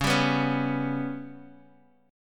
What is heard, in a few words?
G Major Flat 5th chord